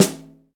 small reverb acoustic snare f sharp key

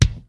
crispy kick drum a sharp key

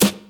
trebly snare sample g key

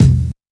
kick sample E key; kick drum samples; single hits one shots

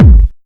smooth bottom end kick drum sound a key

